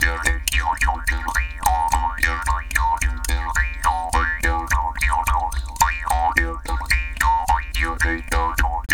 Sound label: Jaw Grooves, Didgeridoo and Other Primitive Instruments; Big Fish Audio - Didgeridoo and Other Primitive Instruments